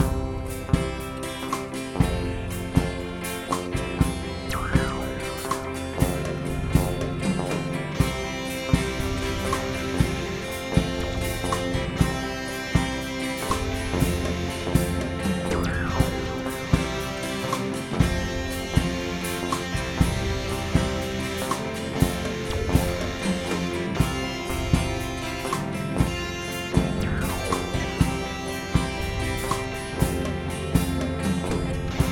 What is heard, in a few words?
amplitube rig bass